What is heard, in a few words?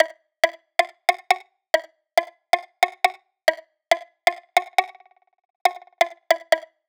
Bpm138 Perc Loop; Circular; Dubstep